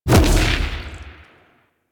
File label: Edge Kick Large; Warframe; Warframes; Pacifist